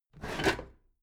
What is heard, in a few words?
packed drawer smooth clatter open heavy halfway